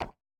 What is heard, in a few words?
stone step, footsteps